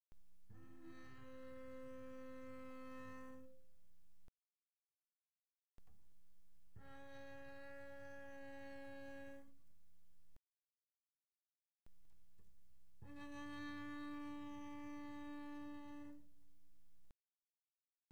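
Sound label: Bass arco pianissimo sul D C4 D4, doublebass; Strings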